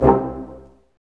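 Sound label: BRS Large Ensemble stac; Roland L-CD702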